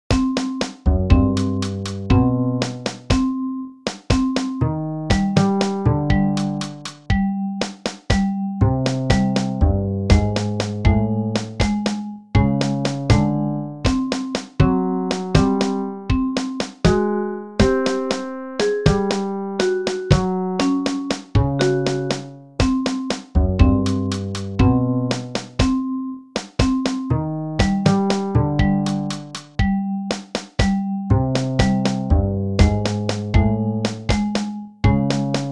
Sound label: spin line Bass